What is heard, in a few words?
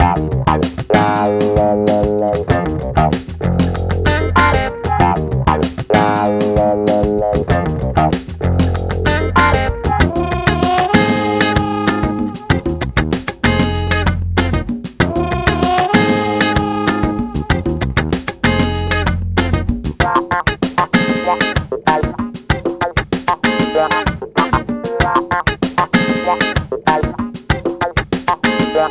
70s funk